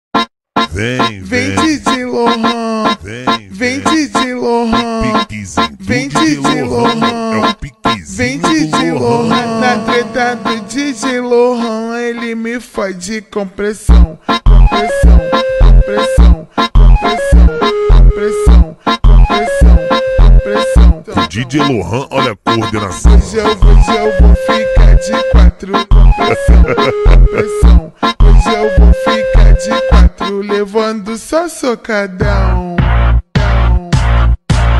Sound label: robot funk slowed reverb